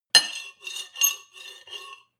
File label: cutlery on plate cut squeak; berufe; soundpool